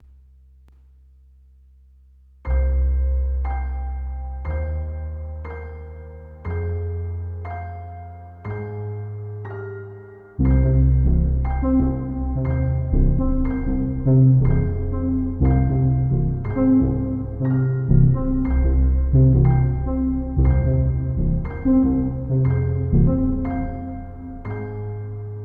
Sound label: Careful plucking tuba